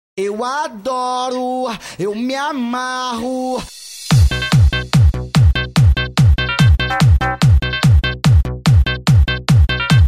pancadao funk bass

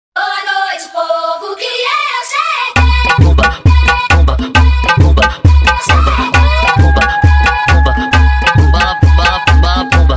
funk mega bass

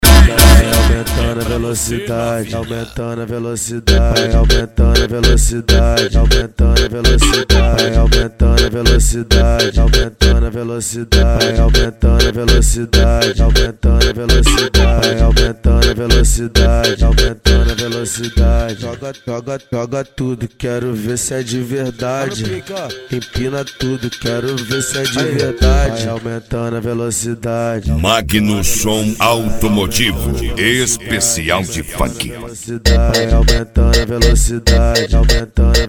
funk

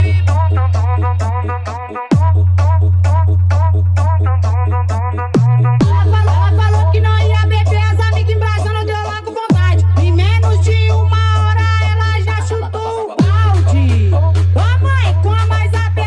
funk bass

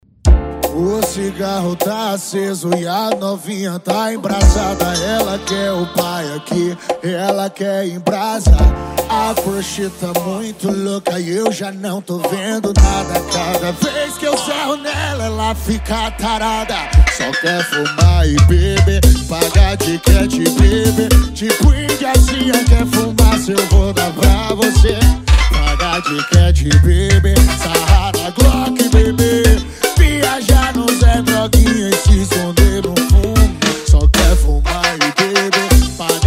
sound car; pizeiro; pisadinha

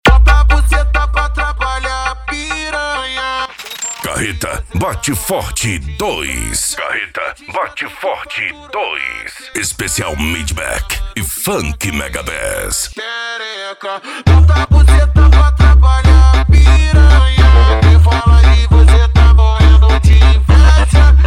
midback e funk mega bass

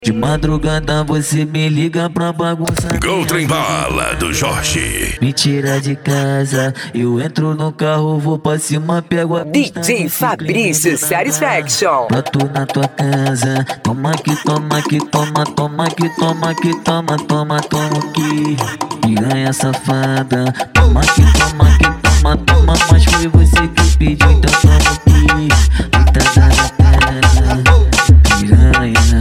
funk mega bass

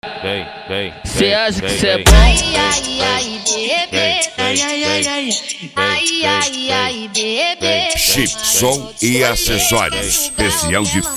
especial funk

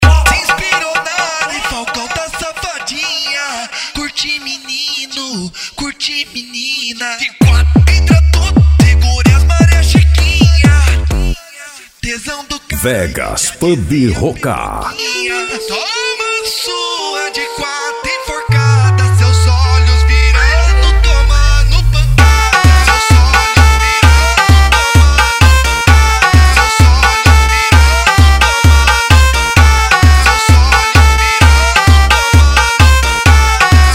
funk mega bass

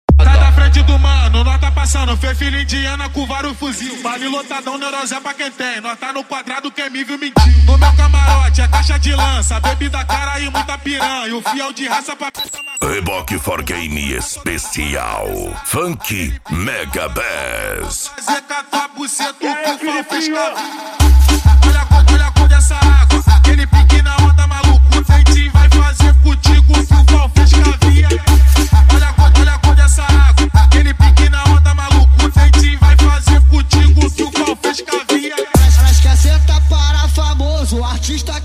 funk mega bass; reboque for game

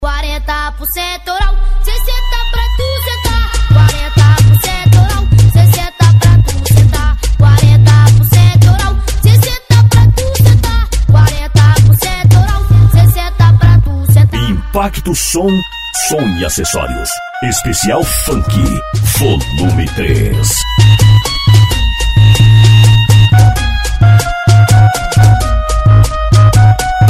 funk bass; impacto som

